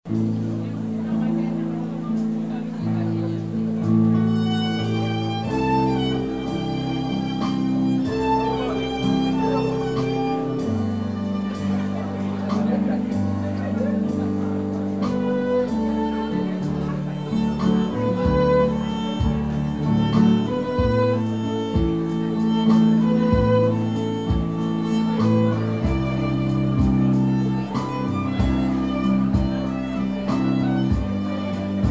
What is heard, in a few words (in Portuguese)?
Piano e Cordas